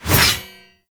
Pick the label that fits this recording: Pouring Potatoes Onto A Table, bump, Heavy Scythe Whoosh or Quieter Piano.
Heavy Scythe Whoosh